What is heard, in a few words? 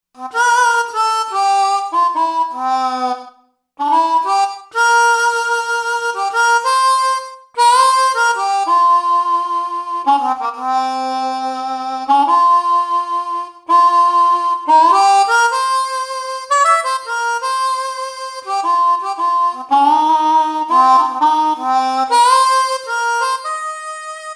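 Blues Harp V-S, Roland Fantom XR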